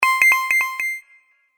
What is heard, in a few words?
Echo Interface Sound, high tech